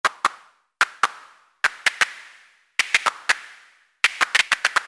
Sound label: One Hand Clapping; Alesis airSynth